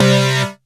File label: SYN TEKNO; Best Service Gigapack 1